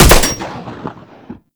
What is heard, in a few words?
Fire Mech; Warframe; Weapons; Strun